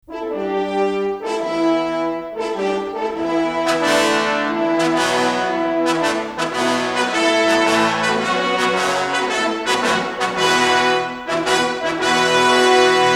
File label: Fanfare for Circus Parade; Ben-Hur（宾虚.1959）